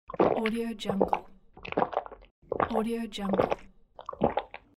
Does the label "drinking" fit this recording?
Yes